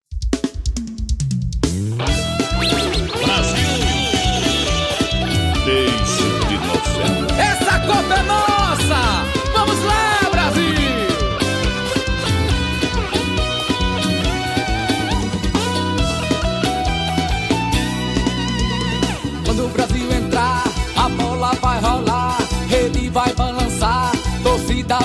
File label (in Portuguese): musica com vinheta